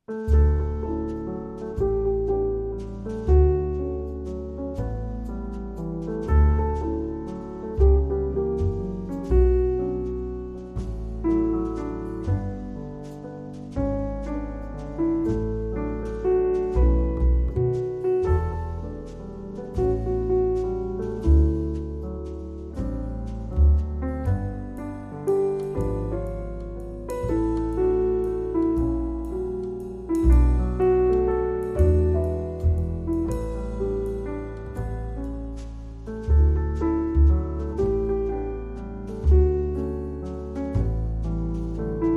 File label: With Trio; 60sec